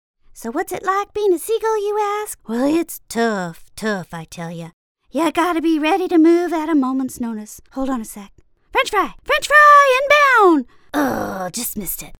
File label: Sea Gull